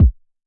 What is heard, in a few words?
Kick Filter; Bad Habbit